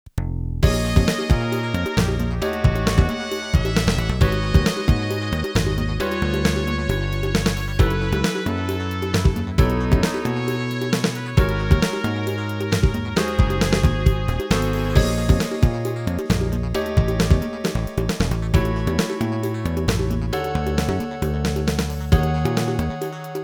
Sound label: BREAK BEAT; Elevator Action Returns